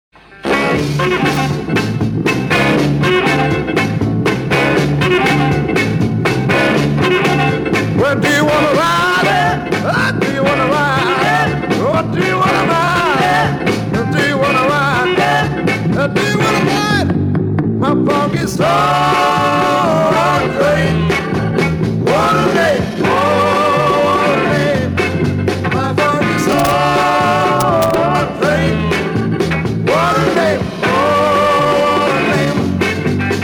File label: funky soul train